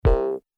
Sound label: lock